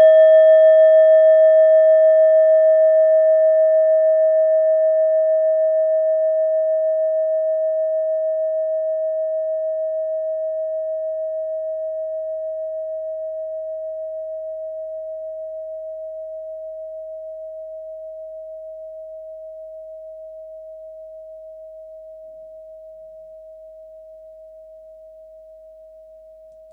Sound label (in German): klangschale set; Klangschalen-Chakraset; Gewicht = 310g; Durchmesser = 10,4cm; Höhe = 5,5cm